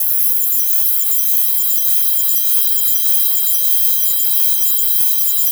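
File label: ELECTRIC SHOCK; Spectrasonic Distorted Reality 1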